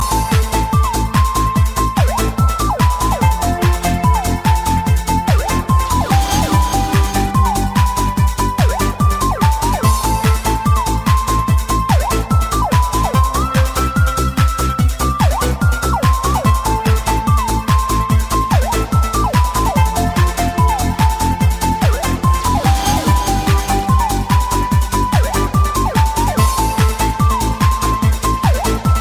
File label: dance